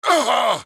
AHurt; Black Adam

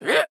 Stripe Jump

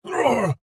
AHurt

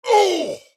AHurt; Black Adam

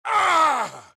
AHurt; Black Adam

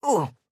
AHurt

